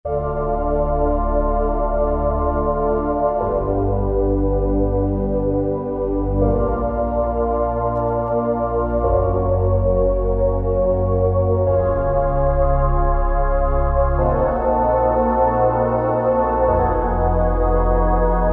Retro Pad; Roland SRX-05 Supreme Dance

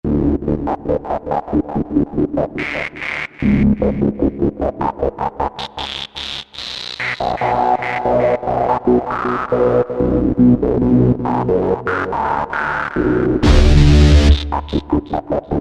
Darkcore, Yamaha EX5R; Factory presets

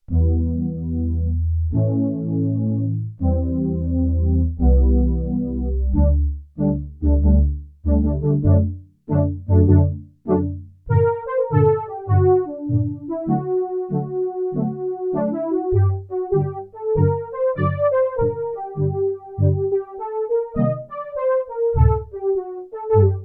Soft Brass, Roland JX-8P

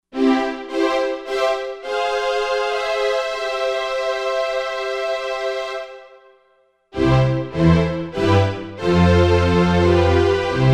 Strings Big Strings, synth

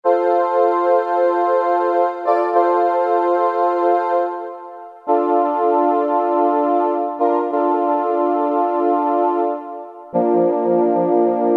ALMOST VOCAL; Akai SG01v

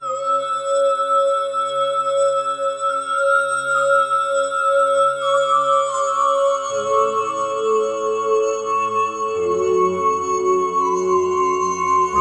Tandorri Bells; Roland VC-1

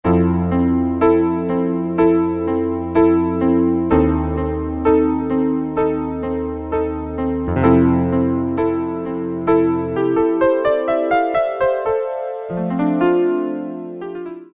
CHORUS

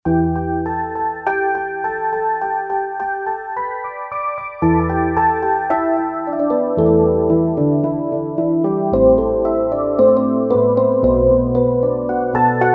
Gamelan Rhodes; Roland SC-880; Factory Patches

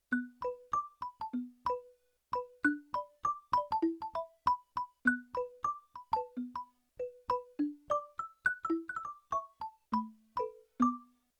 Chorus&Short Celesta

